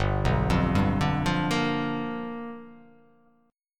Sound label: G 7th Sharp 9th G chord